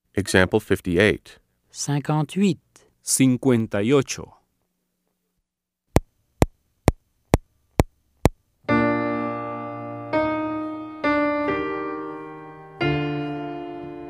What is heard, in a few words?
Keyboard